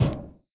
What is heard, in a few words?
step